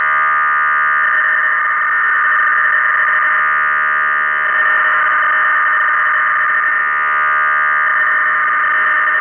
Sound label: KRE FSK CONT REVS; North Korean 600Bd FSK in FEC mode sending reversals; NORTH KOREAN DIPLO FSK; FSK-systems